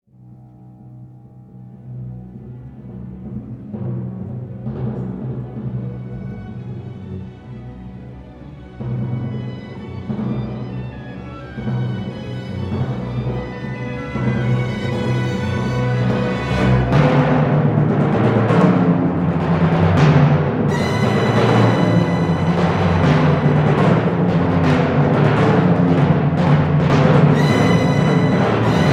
timpani, Two timpani players battle it out while the rest of the orchestra hangs on desperately; Two timpani players battle it out while the rest of the orchestra hangs on desperately to the one note that might prove their salvation